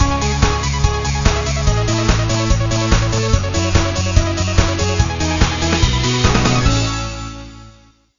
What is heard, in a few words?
Race Start (Short); Ferrari GT2 Revolution; Windows Mobile